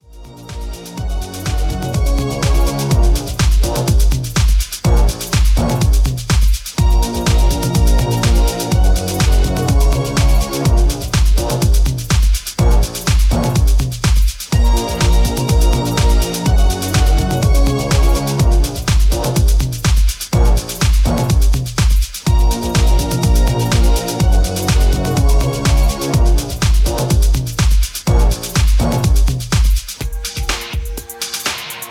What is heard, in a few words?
instrumental mix